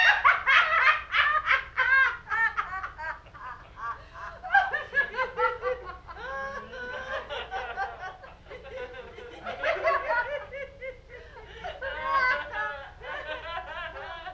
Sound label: laughter